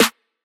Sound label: crispy acoustic snare sample a sharp key